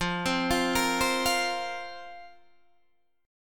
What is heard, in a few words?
F Suspended 4th F, Guitar Half Step Down; Fsus4